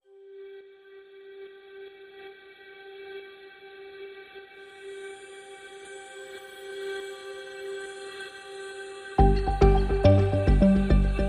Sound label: Pads and Pulses Only